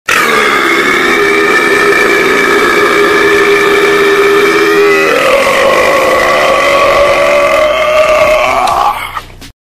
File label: loudest burp